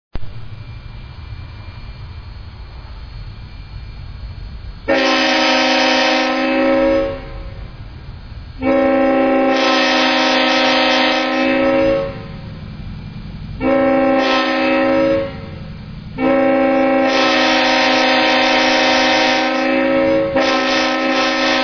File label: Leslie S5T, Horn